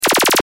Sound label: Sci Fi Laser Gun Beam Blaster Burst